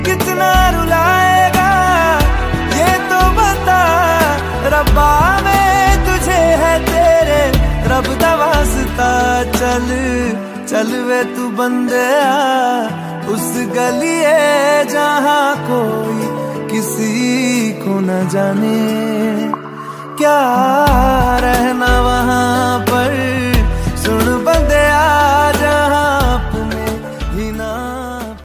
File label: love bollywood ringtone; bollywood ringtones